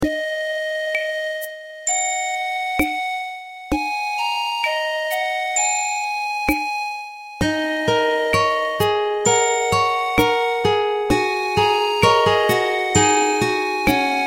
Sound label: oriental calm